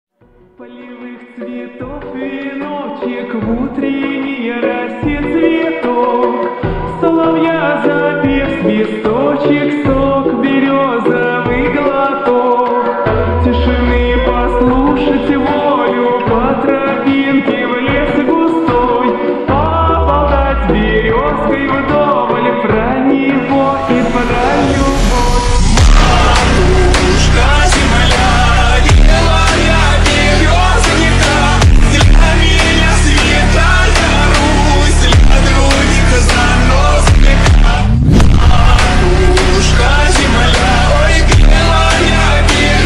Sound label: bg music